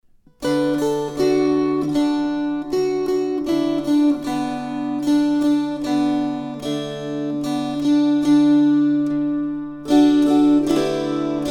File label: String Choir